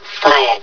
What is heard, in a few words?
fire; hl1mp; XDM